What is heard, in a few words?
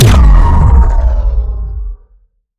Shot Large Laser Ion Fusion